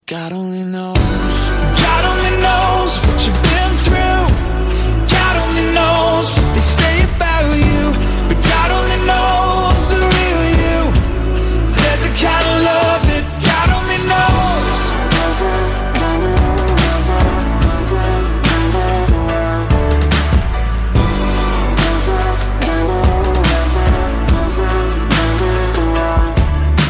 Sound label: country ringtone; king